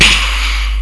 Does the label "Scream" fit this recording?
Yes